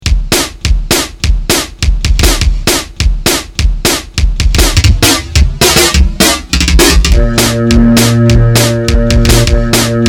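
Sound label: Pop Music Flange Remix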